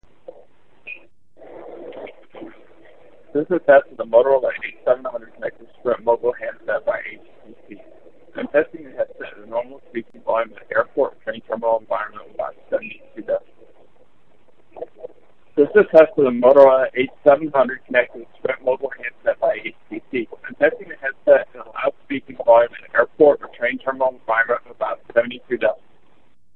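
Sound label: Airport 72db, headset; Moto H700B; Mogul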